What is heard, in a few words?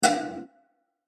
Plastic Hit; Miscellaneous Impact; Impacts; Crafted Signals